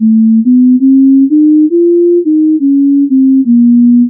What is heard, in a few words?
pitch drift take; practicelens